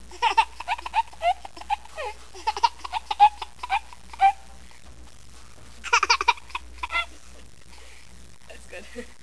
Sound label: laugh